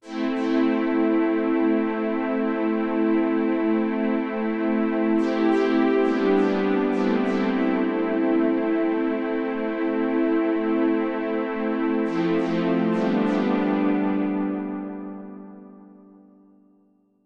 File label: pads